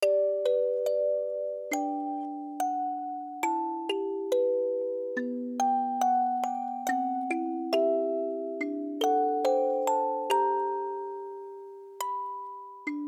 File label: Aria (Music Box)